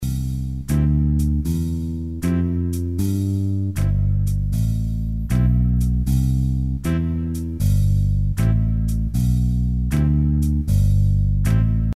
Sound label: slowfox walkingbass